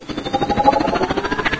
attack helicopter charge up; video game; npc; Source Engine

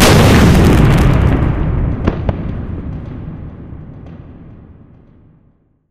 firewerks burst; ambient; Left 4 Dead 2; Source Engine; atmosphere

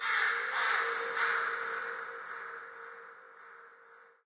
amb crow; source engine; portal2; birds

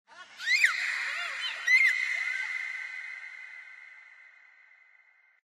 animal call bird weird; Source Engine; tf; lair

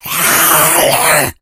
rage at victim, infected

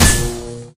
batsaber hit flesh

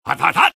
soldier taunt flip exert; video games; source engine; taunts

